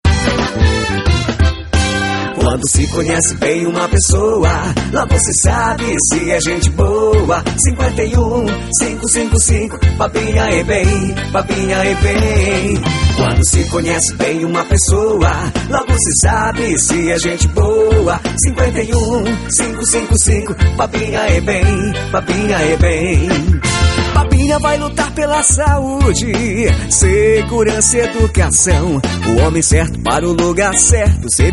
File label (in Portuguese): Jingle politico